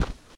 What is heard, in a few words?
Ocean Ground Tap; Blastmorph Pinball; xbox series xs